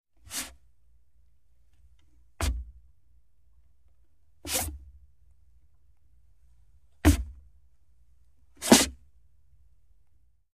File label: Footsteps Hollow Woo